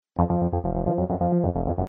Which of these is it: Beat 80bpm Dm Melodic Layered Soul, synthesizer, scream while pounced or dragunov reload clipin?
synthesizer